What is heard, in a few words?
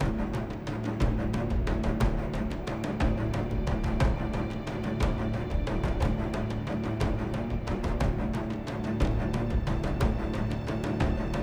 Action Loop; 25 Rpg Game Tracks